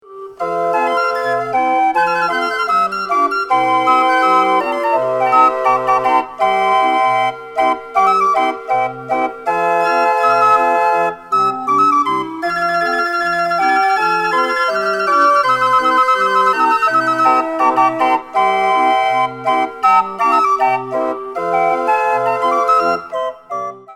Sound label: 26er violinopan spiel mir eine kleine